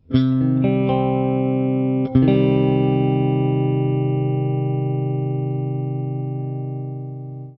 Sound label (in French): Do mineur, Guitare Accords ouverts